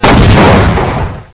explode, weapons

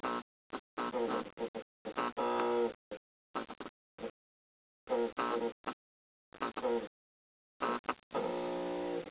light broken; de cobalt

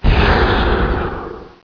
frost nova; snow

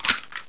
clip out, weapons

